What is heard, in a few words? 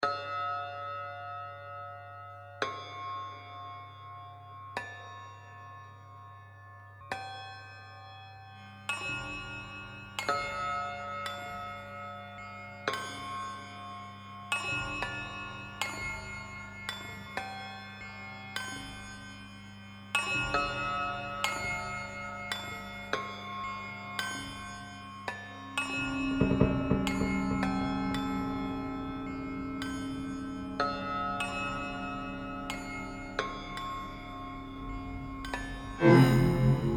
Improvisations, Pizzicati